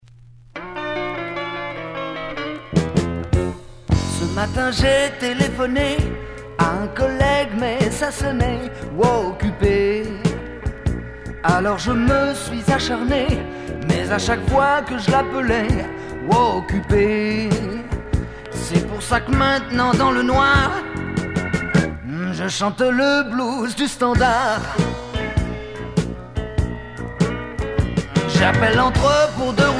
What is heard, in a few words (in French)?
blues vocal